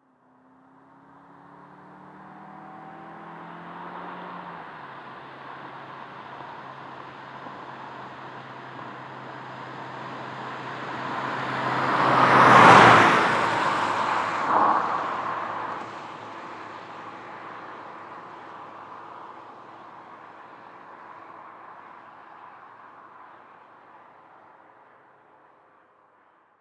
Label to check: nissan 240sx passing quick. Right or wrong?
Right